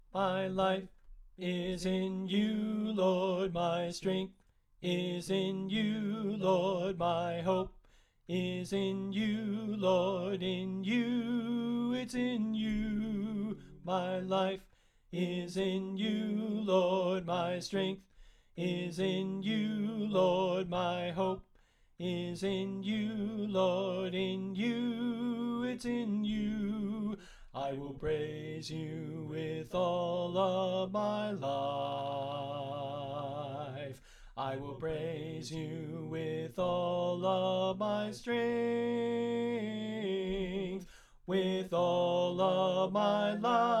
Soprano Left

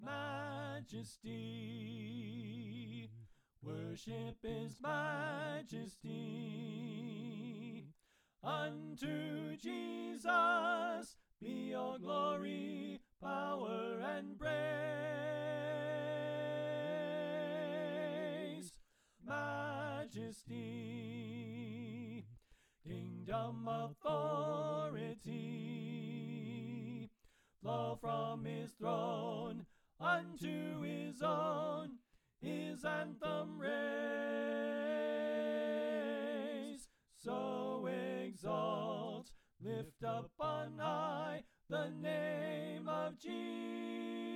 Tenor Left

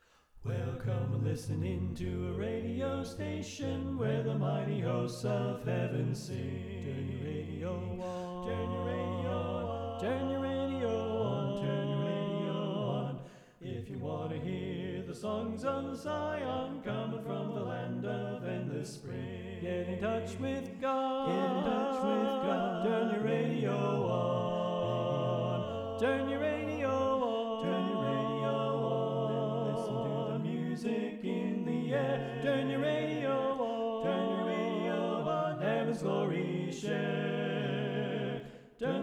Reverb; Pennsylvania Groups; NBC

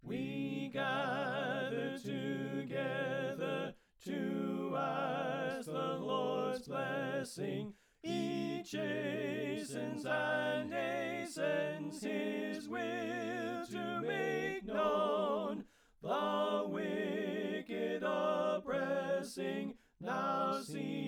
Alto Left; We Gather Together

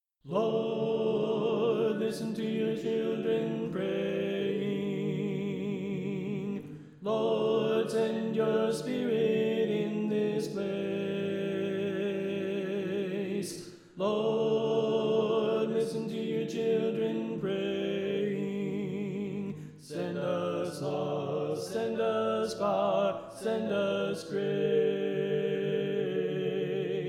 Tenor Left

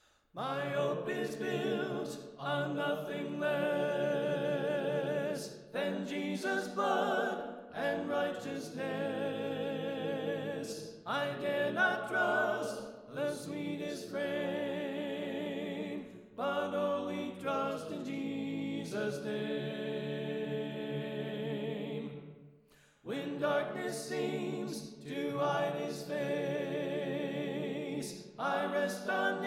Alto Left

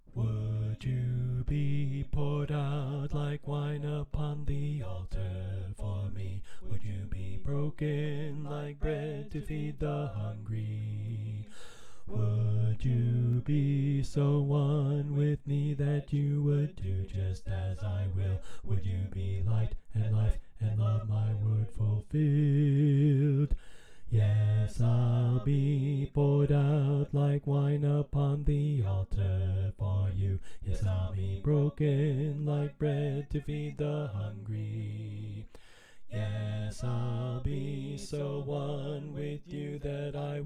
Bass Left

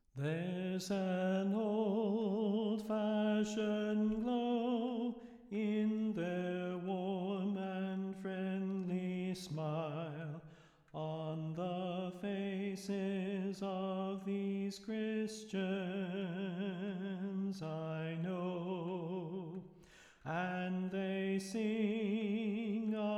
Reverb; Pennsylvania Groups

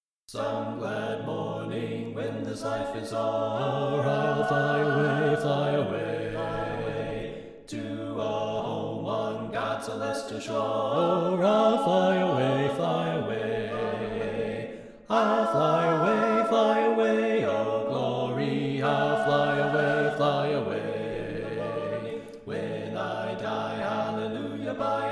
SATB Bass Left

